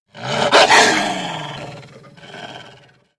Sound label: wolf attack, zombie; cs16; cstrike; basebuilder